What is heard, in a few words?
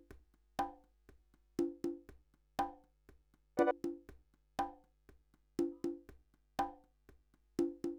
Trance